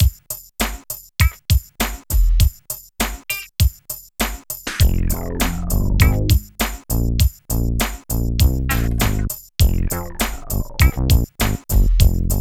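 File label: LOOP, right; East West - Phat & Phunky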